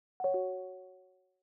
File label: call leave; mail; odoo